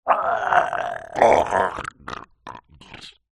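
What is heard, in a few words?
zombie sound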